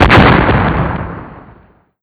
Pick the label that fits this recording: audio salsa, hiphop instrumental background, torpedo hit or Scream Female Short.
torpedo hit